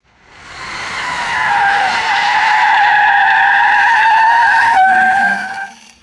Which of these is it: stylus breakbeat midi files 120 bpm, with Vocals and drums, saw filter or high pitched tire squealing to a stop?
high pitched tire squealing to a stop